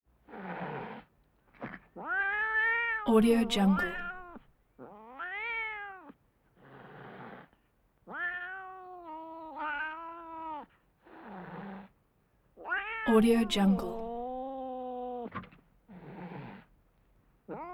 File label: cat hisses